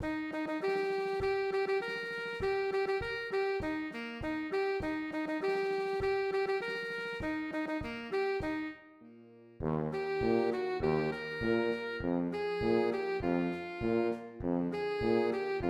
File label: altsax